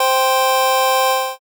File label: organ